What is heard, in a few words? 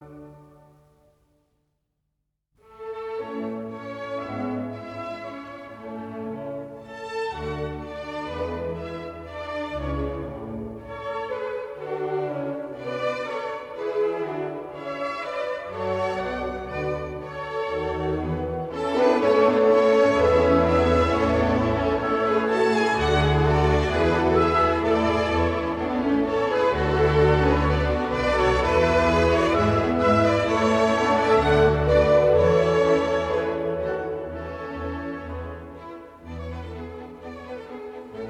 Serenade No. 7 in D Major, K. 250 VI. Andante; Haffner; Mozart, Wolfgang Amadeus